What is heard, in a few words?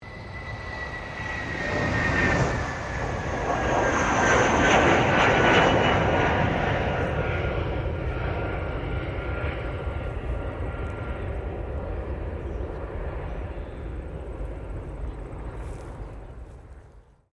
AIRCRAFT A320 AIRBUS LAND; Airplanes